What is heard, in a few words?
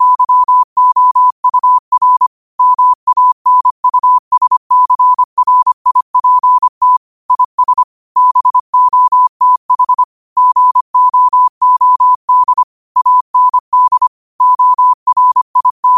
5 wpm, morse